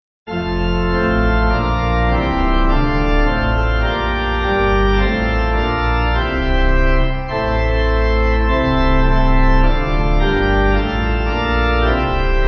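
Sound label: Pipe Organ, Music Backing Files for Churches; Rendez A Dieu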